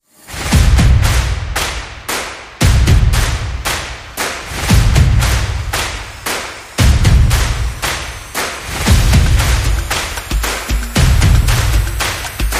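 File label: Fast Fun, sport and action music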